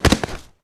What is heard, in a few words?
fall